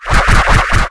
shield shooting

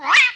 nine tail attack

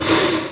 rgan attack

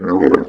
attack

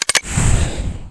grenade fragment wind; night watch

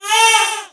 yoyo damage

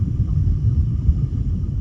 in water

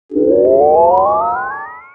power velocity, effect

